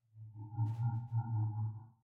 Strange Noise; Lethal Company

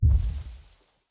Distant Rumble

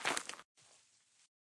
grass

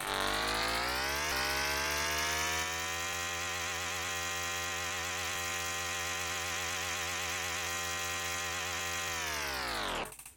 Turret Berserk Mode; Lethal Company

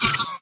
caw scream

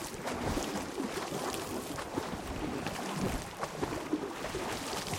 Move Through Water; Lethal Company

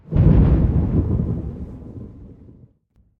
thunder; Lethal Company

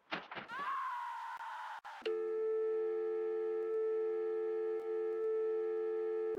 Phone Scream

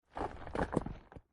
Rock Crumble; Lethal Company

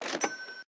Purchase

